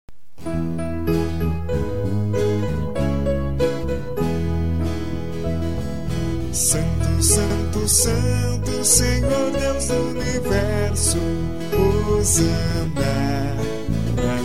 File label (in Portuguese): Santo Melodia Africana, cantos